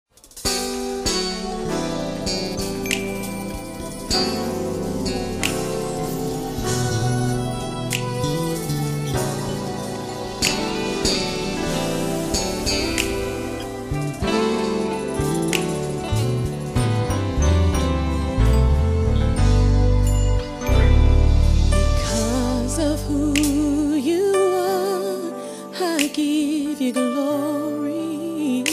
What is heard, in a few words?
Gospel Music